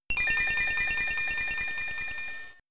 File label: Feather Dance; Pokemon; Gen 3; attack moves; RSE